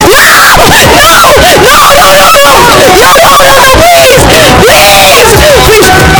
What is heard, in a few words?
scream earrape